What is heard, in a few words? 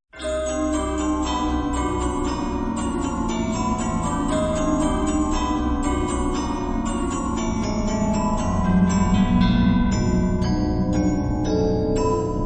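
music box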